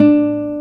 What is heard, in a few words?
G D3, nylon guitar; AKAI S6000 CD-ROM - Volume 1; NYLON GT